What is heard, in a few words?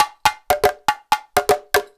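emelako grooves; Best Service; World Colours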